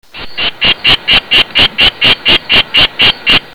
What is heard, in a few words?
D lehmanni, chants